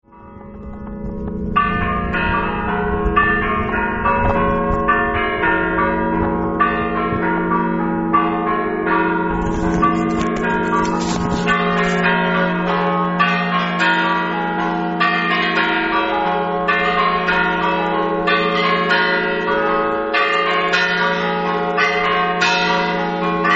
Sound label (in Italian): campane; oleis